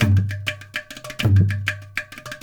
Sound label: India, Heart of Asia